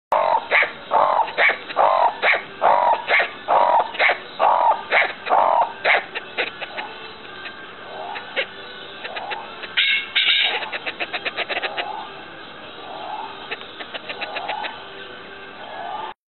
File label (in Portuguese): canto do turaco de schalow